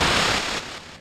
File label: Air Slash